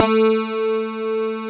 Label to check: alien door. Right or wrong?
Wrong